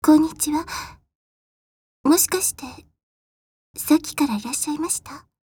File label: ice